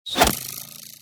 bow hit; Minecraft

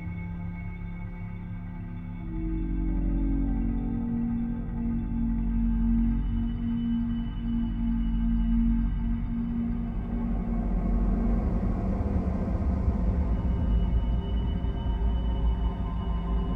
deep dark, ambient underground

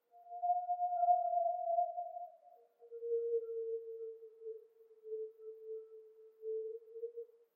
wolf

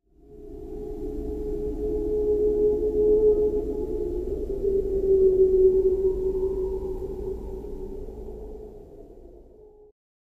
whales; ambient

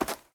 snow run, step; blocks; Minecraft; Remastered

